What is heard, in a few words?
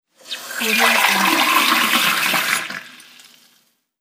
pouring water from the tap; mountainaudio